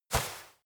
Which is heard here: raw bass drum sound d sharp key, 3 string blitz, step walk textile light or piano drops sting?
step walk textile light